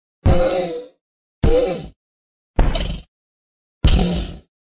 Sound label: hit